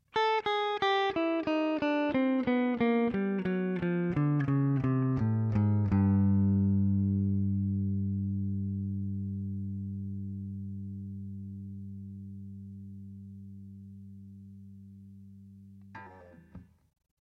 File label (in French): clair gamme